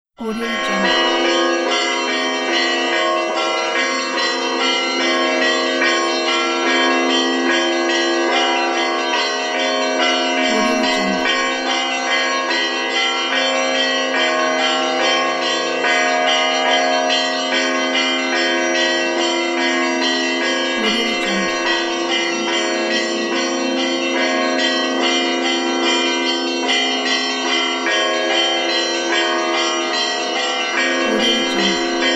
church bells

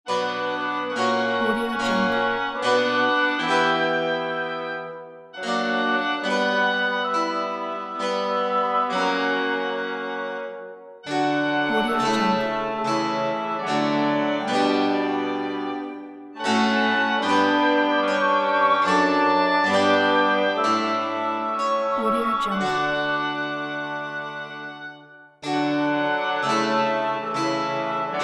baroque anthem

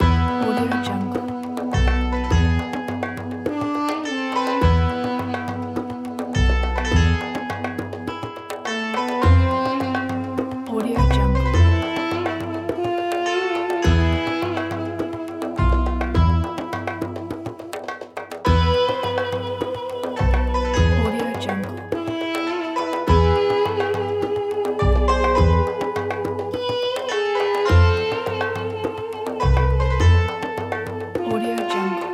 east game loop pack

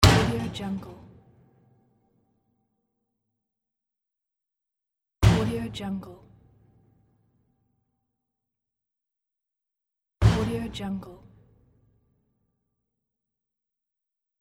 old metal kitchen oven door hit

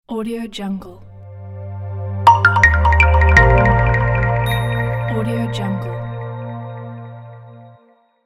logo inspire

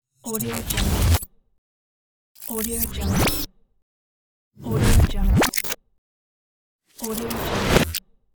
robot sounds of the future

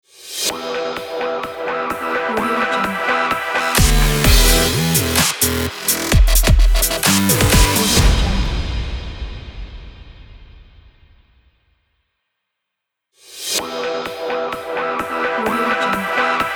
sports intros and outros logo pack

